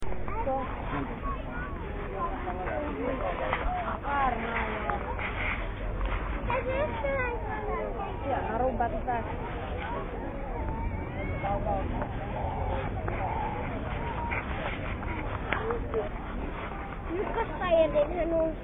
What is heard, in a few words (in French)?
ambiance autour du sapin Turku